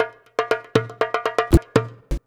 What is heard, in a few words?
119 DJEMBE, Modern Percussion Loops